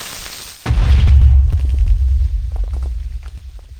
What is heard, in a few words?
minecraft tnt boom